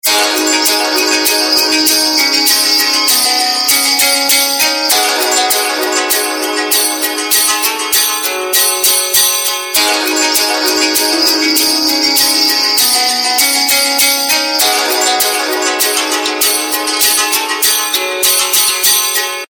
GOA TRANCE; Samsung; GT-B7620 Giorgio Armani

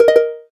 gayageum, keytone

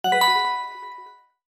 Content, notifications; HTC One-X

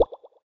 hold bubble unlock; SKY IM-A870S-VEGA-Iron

